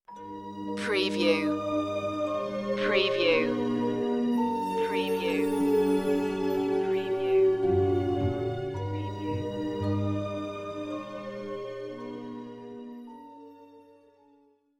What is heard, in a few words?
orchestral fantasy